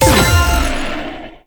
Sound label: Moto Race Boost; Warframe; Motorcycle Racing